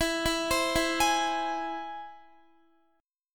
E Augmented, Guitar; arpeggiated; x,19,16,17,16,16

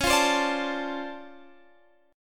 D-Minor 7th C sharp, chord

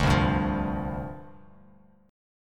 C Suspended 2nd Suspended 4th C Bass Standard; strummed; 10,3,5,10; JGuitar

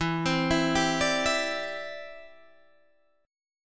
D 7th Suspended 2nd Suspended 4th E

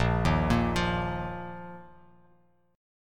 A sharp Suspended 4th Bass Standard; arpeggiated; A#sus4; JGuitar

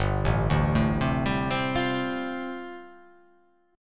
G 7th Suspended 2nd Suspended 4th

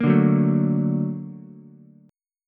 A sharp Augmented Major 7th A sharp Ukulele; strummed; JGuitar

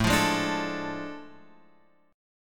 A Major 7th Suspended 4th Sharp 5th; AM7sus4#5 Chord {5 8 6 7 X 5} strummed

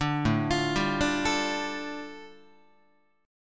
A 7th Suspended 4th Sharp 5th A, Guitar; A7sus4#5 arpeggiated

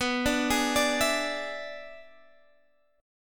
E 7th B, guitar

